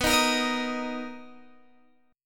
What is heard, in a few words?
B Major 7th B down Guitar DADGAD